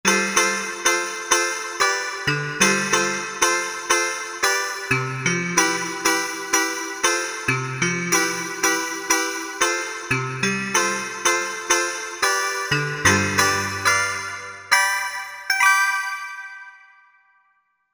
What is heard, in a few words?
2 voices; Korg MSC-12S Synth 3